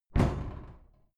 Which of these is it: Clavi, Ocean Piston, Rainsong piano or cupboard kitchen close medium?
cupboard kitchen close medium